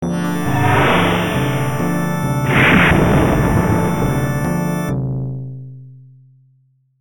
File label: Title with SFX; Blue Hawk; arcade